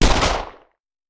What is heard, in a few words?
Hand Gun; Sound Ideas; Guns